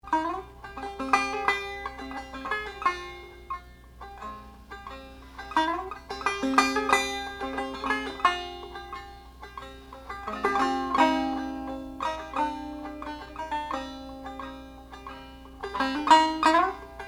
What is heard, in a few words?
banjo